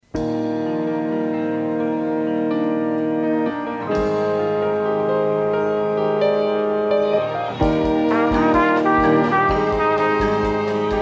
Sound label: Curtain Call Exit Music